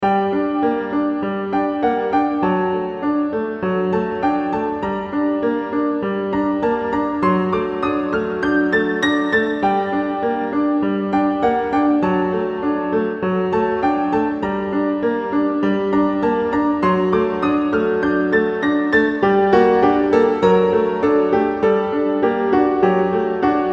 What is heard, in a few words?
Sonata para piano; OpenBoard